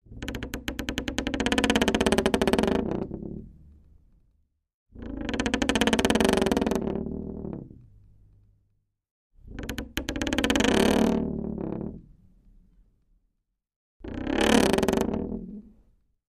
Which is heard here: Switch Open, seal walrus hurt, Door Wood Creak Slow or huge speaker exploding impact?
Door Wood Creak Slow